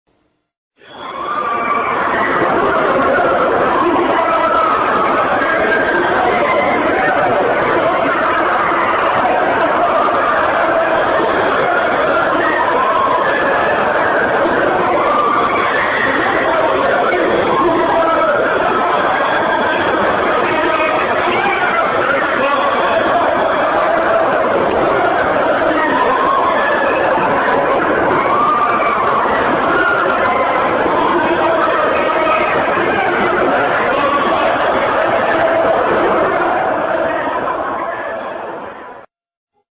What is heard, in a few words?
screams